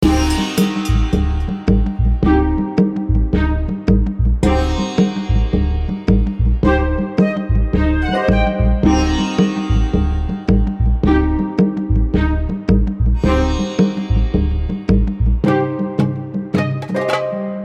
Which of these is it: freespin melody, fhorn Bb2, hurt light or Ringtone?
freespin melody